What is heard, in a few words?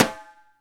Snare, Alesis DM5 Drum Machines